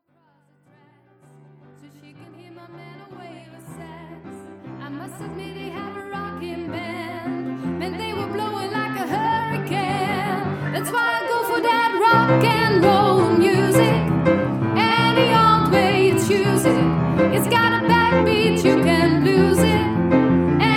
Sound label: ROCK N ROLL